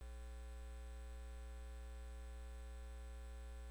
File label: drum